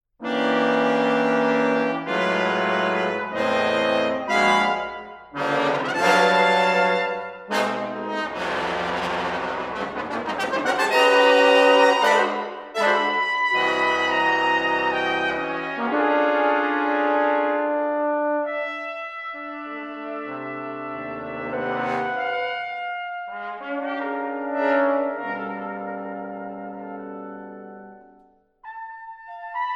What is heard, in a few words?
Brass Quintet, Allegro vigoroso